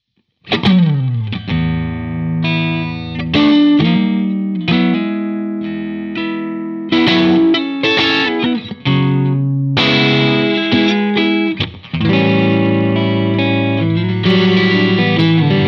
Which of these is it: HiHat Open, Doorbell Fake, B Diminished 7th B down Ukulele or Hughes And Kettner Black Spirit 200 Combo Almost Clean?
Hughes And Kettner Black Spirit 200 Combo Almost Clean